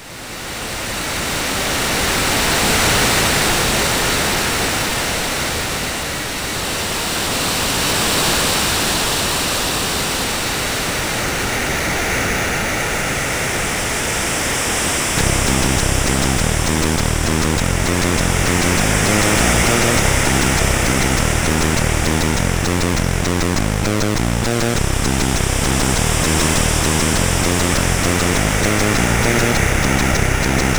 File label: Sea Music, CSG8580R5